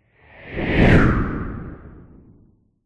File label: science fiction space junk debris zoom by; zapsplat